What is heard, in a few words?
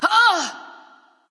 grunt hit; bellona